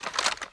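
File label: Inspect Movement; Valorant; guardian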